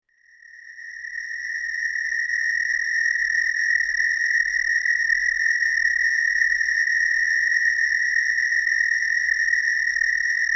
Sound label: Nature